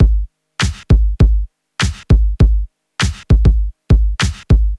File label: 100 bpm modern drum loop sample g sharp key